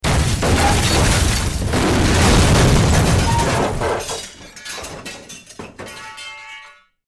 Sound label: Large Impacts, metal & glass